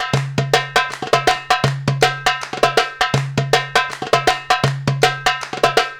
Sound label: PERC LP, Arabian Traditions